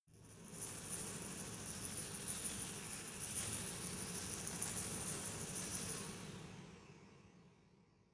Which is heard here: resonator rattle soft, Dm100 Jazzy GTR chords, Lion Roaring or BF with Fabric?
resonator rattle soft